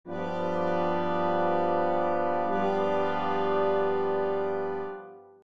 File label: brass; Nikolai Rimsky Korsakov